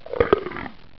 chomp sound; Mazeman

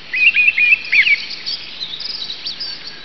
Mistle Thrush

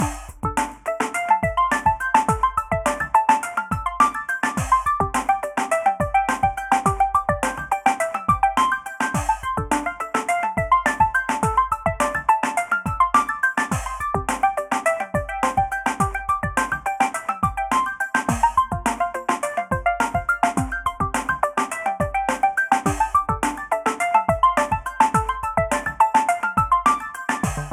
8-Bit LoFi

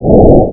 Whoosh; 1 MEG ANIM

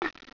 dot sound; Mazeman

